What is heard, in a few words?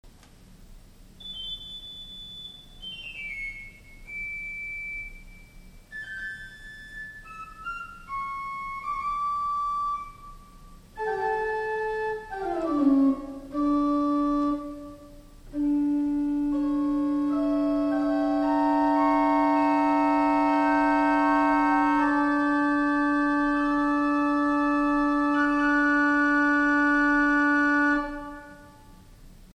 Organ Swell Gemshorn 2